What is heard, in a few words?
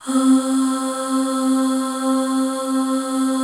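C3 female ahs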